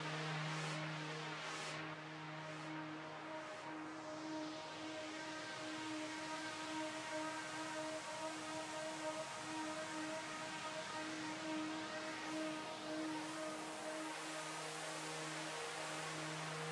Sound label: air pad, Smooth RnB, Ebmin; Big Fish Audio; Legendary; Happiness